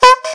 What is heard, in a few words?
combo break; Doomsday v3.0 green; Toonzz